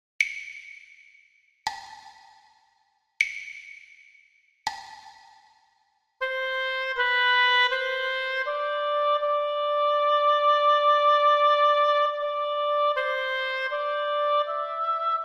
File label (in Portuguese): percepcao ditado